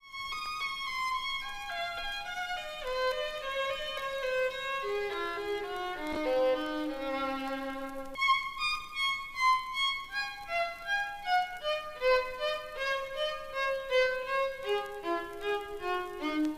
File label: Violin; reciclar tocar